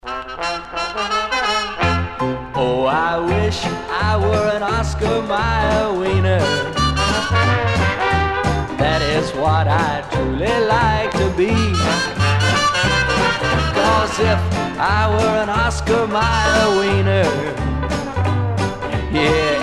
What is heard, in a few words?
dixie